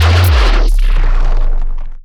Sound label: hit me shield; allegiance